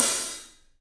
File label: Paiste hat, drums; 3D Sound